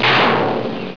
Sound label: hit; netpanzer